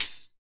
TAMBOURIN, HR-16 DRUMS; Drum Mashines; EdgeSounds

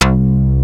SPLIT; Rubber Chicken Software Sample Bank

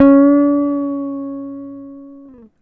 GTR TRIK, Jazzistic; Univers Sons

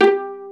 PIZZ VIOLA; E-MU Formula 4000 Series Vol. 5; Protozoa; Proteus2 Instrmt

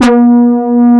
AN-SYN, AN BASS; AKAI S-Series CD-ROM Sound Library VOL-7

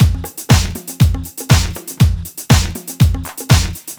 120 Full Drums, Miami Bass; Zero-G; Soundclash Nu Dance Electro; Combi Loops; 120bpm; Miami Bass Machine

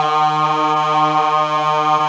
GOSP. AAAHH; Sound & Vision - Gigapack II CD 1

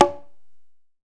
conga; Zero G - Man Machine

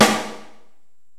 STEREO DRUMS

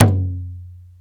bata; AKAI S-Series CD-ROM Sound Library VOL-5

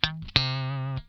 LICK SCALE, Funk Guitar; Zero G - Funk Guitar

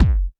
BD, Bassdrums, Roland TD-20

